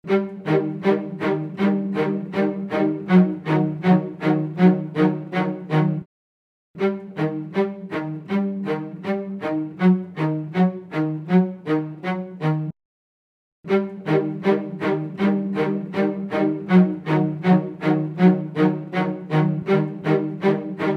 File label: H910 dual harmonizer